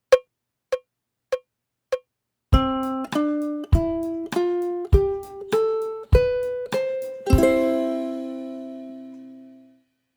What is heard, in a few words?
c major scale and chord